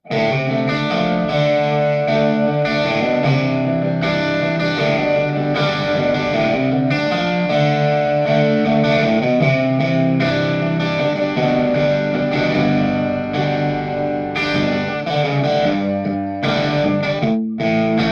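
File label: subway play for tips electric guitar; The Sims 3; Windows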